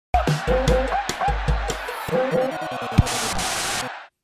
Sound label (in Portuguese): fdc musica de abertura